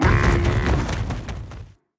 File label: enemy razing Rhino charge